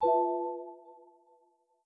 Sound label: Windows User Account Control